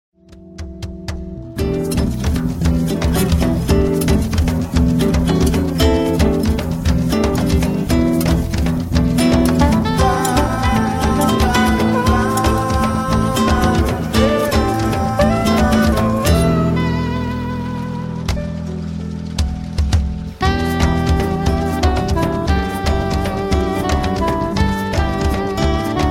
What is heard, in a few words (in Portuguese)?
sax soprano solos